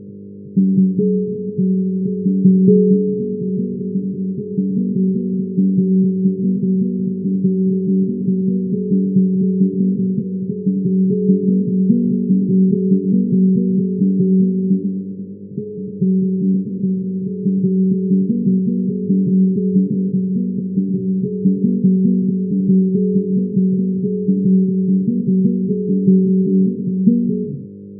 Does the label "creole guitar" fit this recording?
Yes